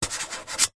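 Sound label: office pen scribble signature on metal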